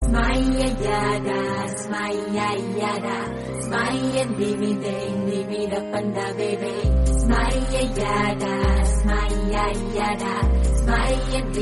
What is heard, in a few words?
telugu ringtone